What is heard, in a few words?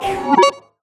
Collectibles Various FX; Video Game; Cymatics; Video Game Sample Pack Vol 1